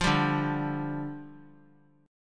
D Minor Double Flat 5th, down; Dmbb5; strummed; JGuitar

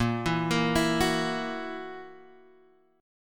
F sharp 7th, guitar

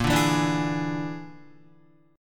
Bb 7th Flat 5th, Guitar; Bb7b5; strummed; JGuitar

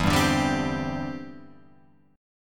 E Minor 7th Sharp 5th, down, Guitar, Open F; strummed

